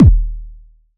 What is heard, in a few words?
Plastic Kick; Roland BK-5